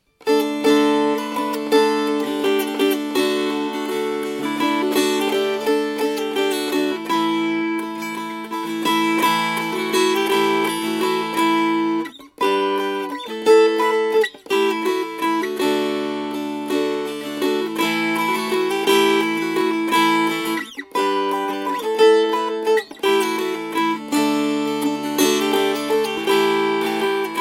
Strummed

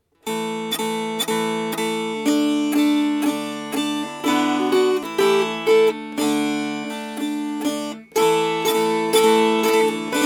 Strummed Round; He That Will an Ale-House Keepe

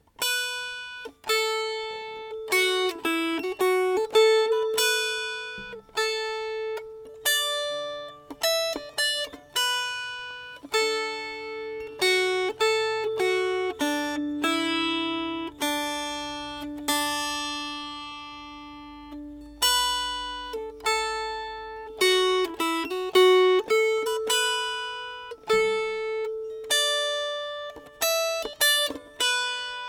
Melody

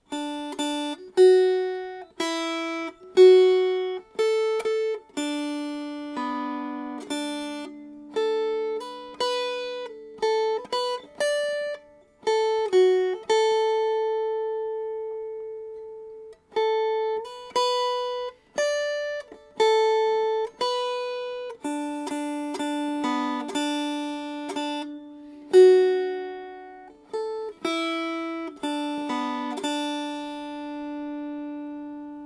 Melody

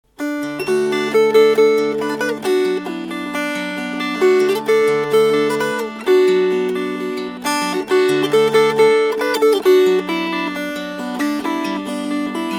Finger-Picked

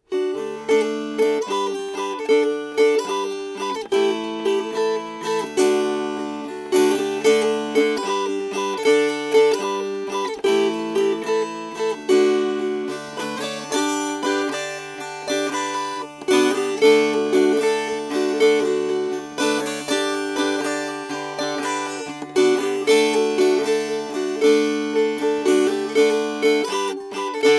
Strummed

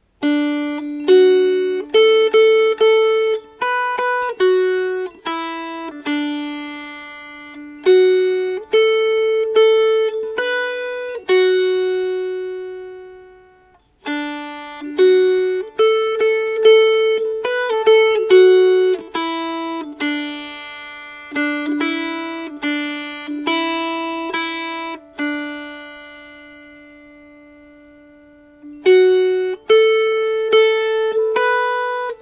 Melody; Factory Girl

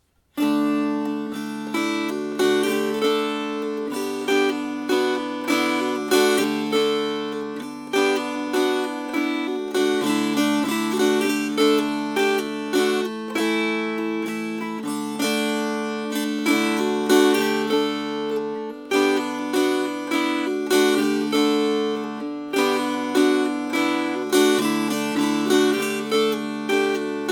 Strummed, Dulcimer

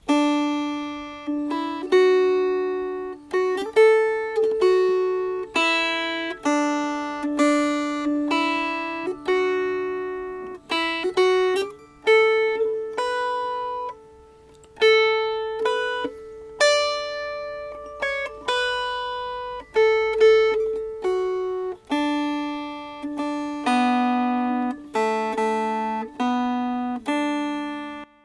Sad Am I, And Sorrow Laden Melody

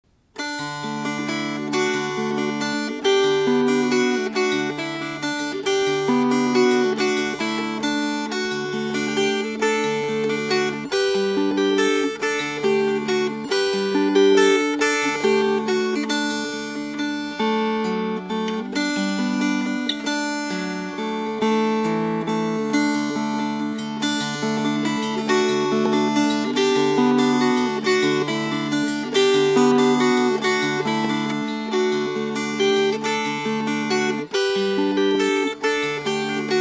Fingerpicked

